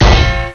clang

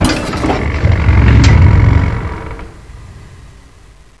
door move

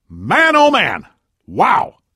cheer, voice

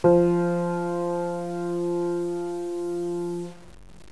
piano f2

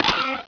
pain; zombie soldier

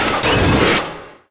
wheel

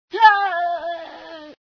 scream, female sci

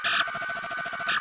predator search

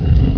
wall

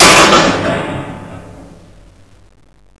metal, debris

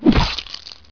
hit; desastre

